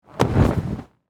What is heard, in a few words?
Gymnastics Mat, Sports, Gymnastics, Mat, Land, Body, Fall, From, Jump; Miscellaneous Sport; BlastWave